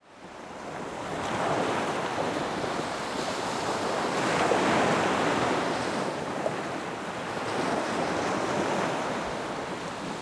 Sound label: Water Ocean Surf; sonderjylland; visitdenmark